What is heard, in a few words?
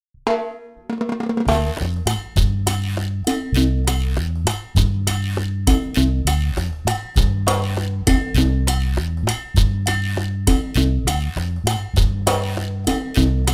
Chachacha